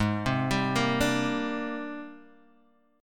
G sharp Major Sharp 11th, Guitar Open G

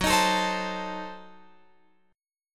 Gb Diminished 7th, Guitar, Open F; Gbdim7 strummed; x,13,15,16,15,16

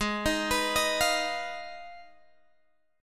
Ab Minor 6th Ab, down, Guitar, Open G

